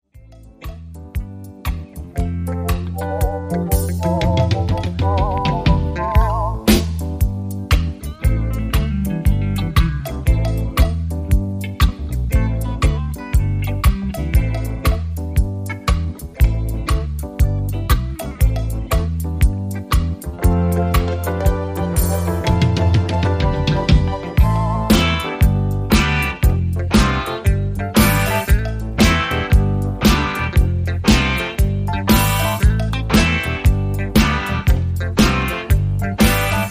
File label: Instrumental